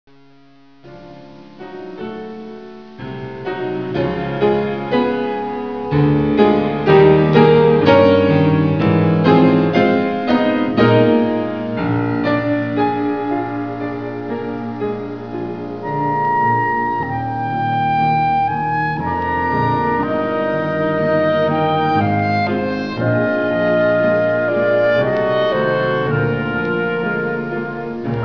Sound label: clar; quintet